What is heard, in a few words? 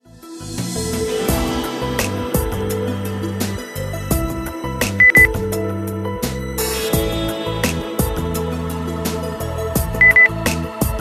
lounge